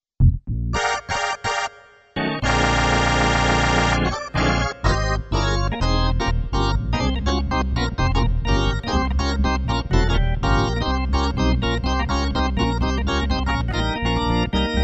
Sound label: Hammond B-3